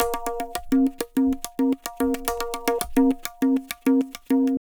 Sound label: Tabla, 105 BPM; Pure Tabla; Zero-G